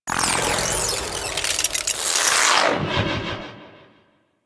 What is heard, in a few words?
God Ray End; Warframe; DE; Sentients; Sentient Trooper